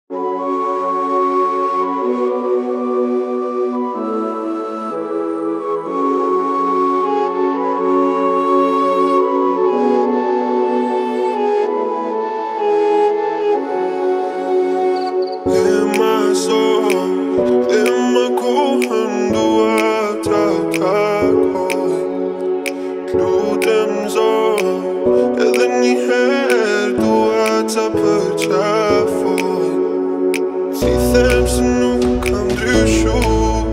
remix

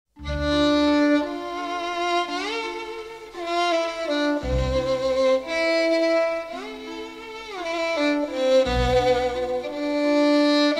violin cover

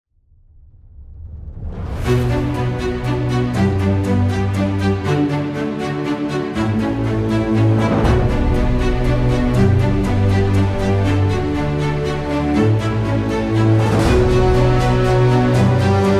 epic victory music instrumental music

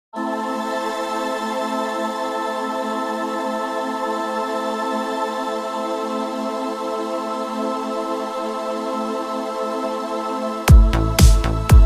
italo disco style gregorian instr; cover

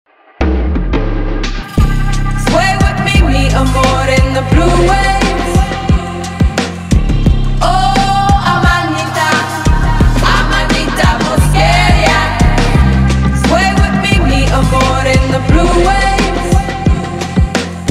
drum bass

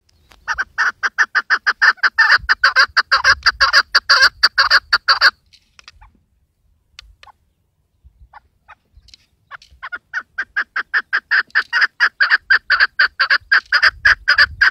keklik sesi dag kekligi partridge sound; sawt alhajl; zvuk

